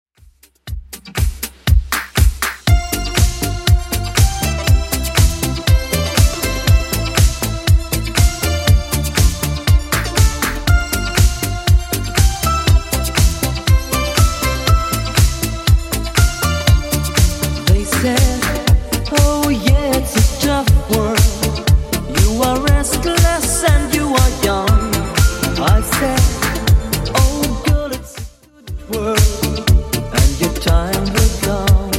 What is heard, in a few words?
80s Redrum 120bpm